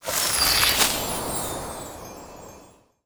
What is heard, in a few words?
hatch flowers; netent